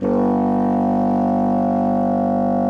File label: G1, bassoon; Xsample VOL-6